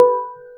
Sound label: combo power; tetrio